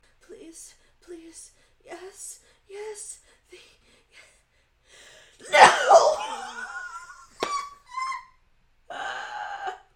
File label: Beg Sneeze